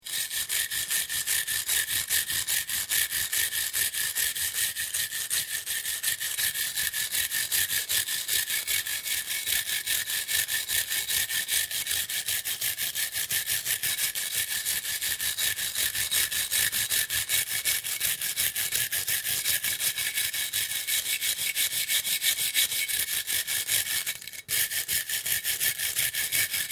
Wire Brush, Grating metal, metal brush.; Hand Tools; Hand Tool Sound Effects; Sound Effects:Tools

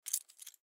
Coin In Hand, Foley; Money Sound Effects; Objects; Blastwave

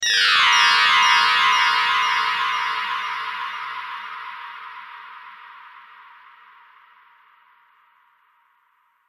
Magicians Casts Sleep Spell, Magic Sound Effects

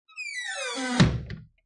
Wooden Door Squeak And Close; Creaky Doors

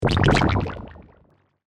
Plasma Pulse By, Scifi, Pass; Science, Fiction; Sci Fi Sound Effects

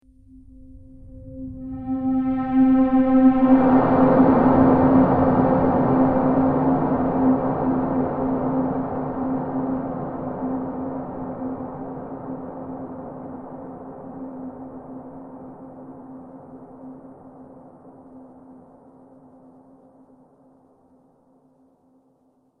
Blockbuster Movie Impact; Miscellaneous Impact Sound Effects

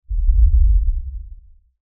LFE Tremor, Lfe Tremor Low Bass Shake Rumble

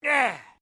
MALE OUCH; Male Vocal sounds; Pain; Voice

